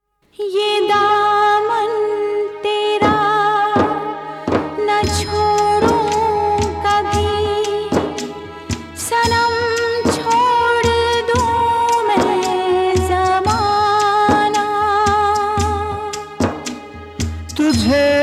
(Sad); Talaashi

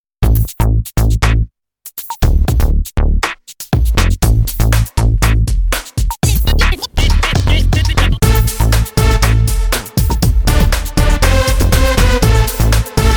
Dance Piece (Instrumental); Super Star

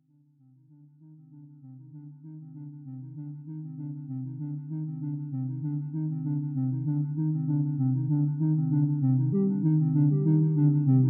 Instrumental

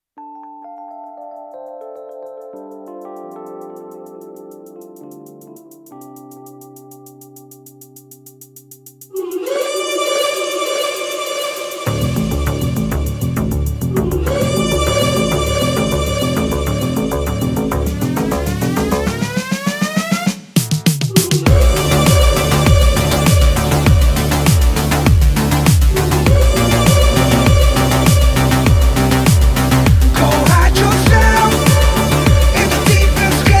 Instrumental; War

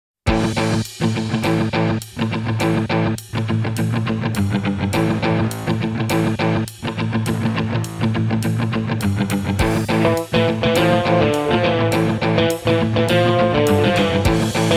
(Instrumental); Happy New Year

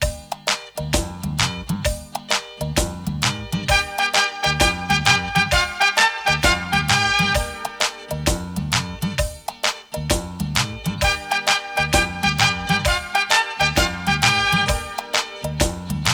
Duet; Laadla

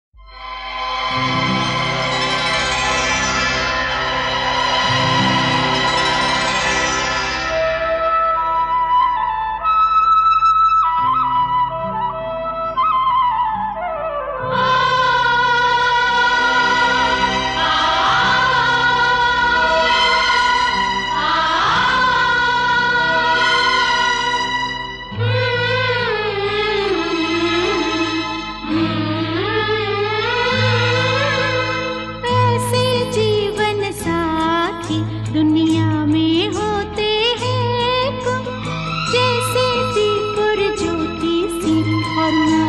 Tana Dim Tana Dim Sad; Zara Si Zindagi

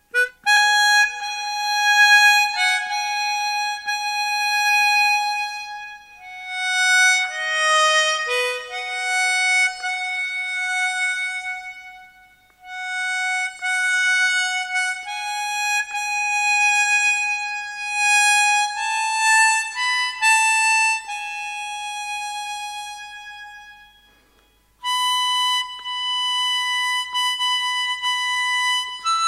Instrumental; Prahaar

